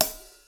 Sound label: Hat